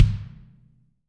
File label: BD, Real Mega Drums